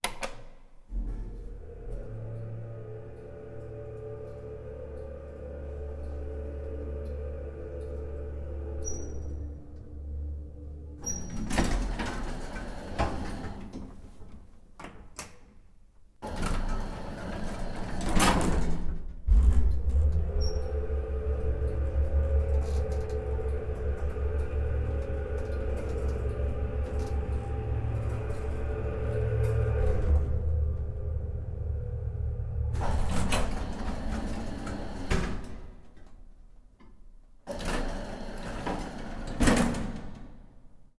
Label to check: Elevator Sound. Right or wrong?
Right